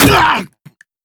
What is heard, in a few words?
pain, human